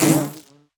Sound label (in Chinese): 蜜蜂受伤声